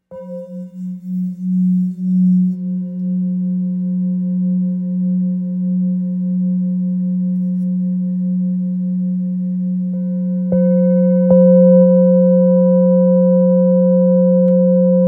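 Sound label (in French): bol full moon fa, bols chantants tibétains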